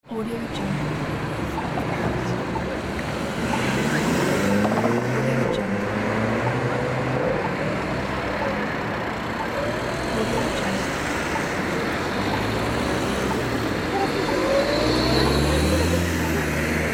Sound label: city pedestrian crossing